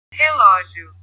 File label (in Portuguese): relogio